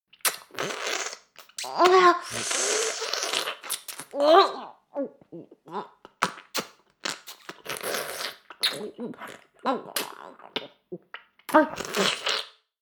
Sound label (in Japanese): フェラ音声; 牧場戦腺; 少尉